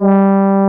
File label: FM FRHORN G3, HORNS; AKAI S-Series CD-ROM Sound Library VOL-2